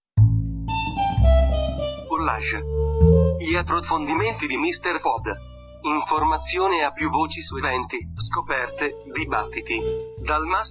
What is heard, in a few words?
jingle collage